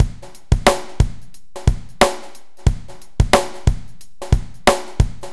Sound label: BPM 90 AMB; AKAI S-Series CD-ROM Sound Library VOL-4